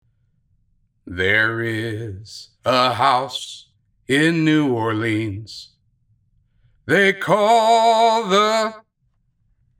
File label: singing waiter